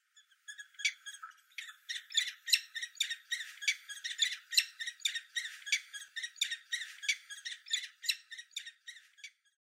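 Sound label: Peregrine Falcon; choshi; koe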